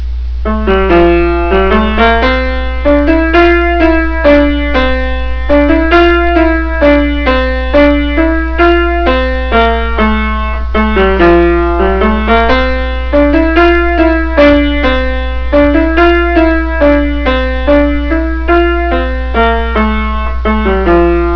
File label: first noel phone